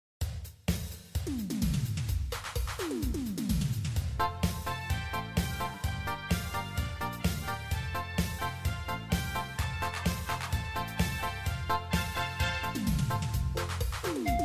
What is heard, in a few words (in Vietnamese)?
nhac beat